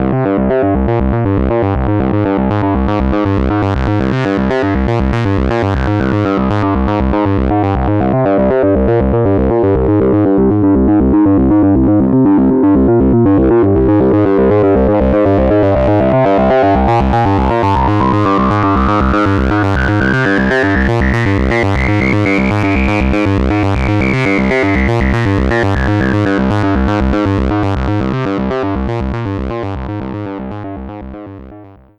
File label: res bass seq